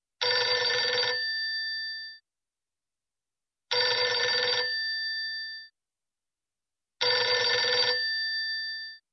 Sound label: Country Phone ring